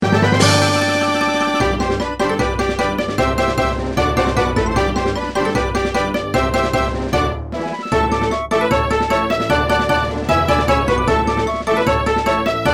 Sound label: Victory!; Pokémon Myth